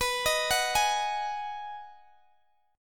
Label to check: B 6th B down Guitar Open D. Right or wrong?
Right